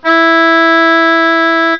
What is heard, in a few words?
overtone